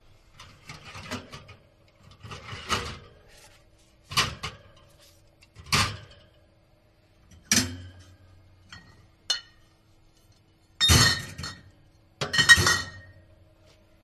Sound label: pub fridge stock cans bottles; field reports